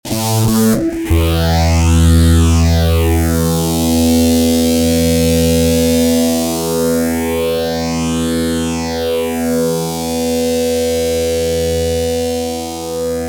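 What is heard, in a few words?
fuzz guitar master phaser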